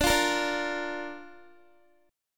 D Minor Sharp 5th D Guitar; Dm#5 strummed; x,17,17,15,14,x

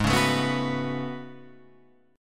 A Major 13th, guitar

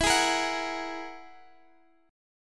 Ab 7th Suspended 2nd Sharp 5th, E

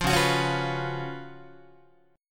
Db 11th, Guitar; strummed; 8,9,9,11,9,10

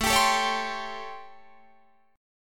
A 7th Sharp 9th, Guitar, Open D

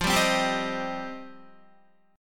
F 7th Suspended 2nd, Guitar; F7sus2; strummed; 13,10,10,12,12,10

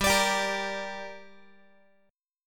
G Minor G down Guitar, chord; strummed; JGuitar

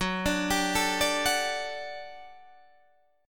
G Major 7th Suspended 2nd F sharp

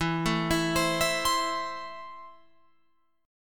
E Suspended 4th Sharp 5th, Guitar Open F; Esus4#5; arpeggiated

